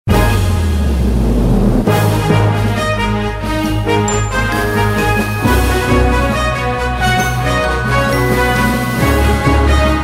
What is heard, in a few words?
WIN MAX; Sizzling 6